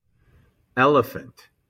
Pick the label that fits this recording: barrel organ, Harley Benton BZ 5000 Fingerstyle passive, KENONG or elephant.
elephant